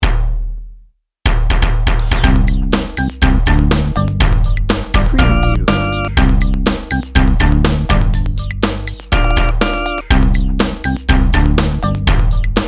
swagger groove bed

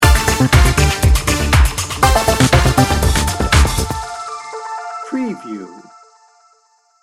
upbeat innovation sting bumper

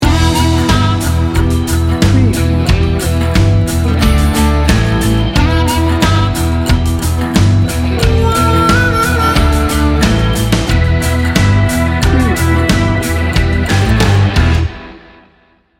southern blues rock

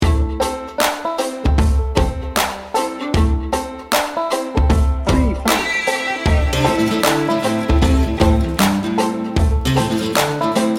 redneck hip hop

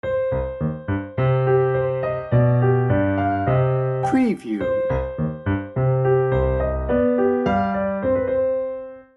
baroque loop

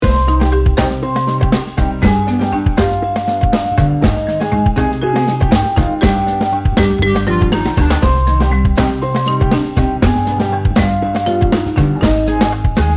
tango mischief

